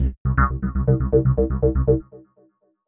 Dig arp 120bpm

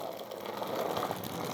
Skate rolling

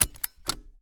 Seatbelt Out

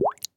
Bubble

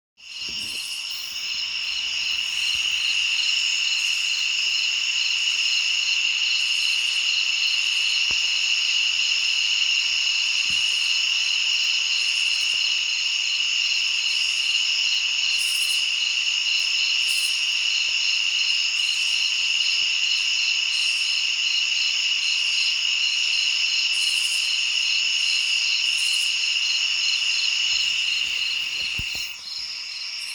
Night In Forest

